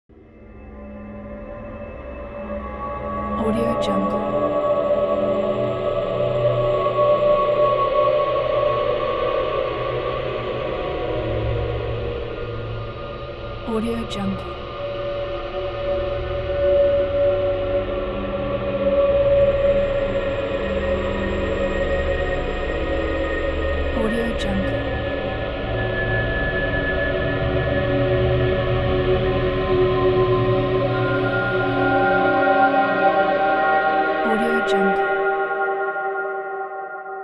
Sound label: scary horror creepy ambient; kipaloops